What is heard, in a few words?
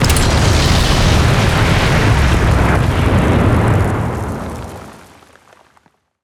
explo mine; Explosions; PremiumBeat